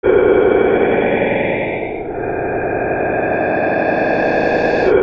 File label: SCIFI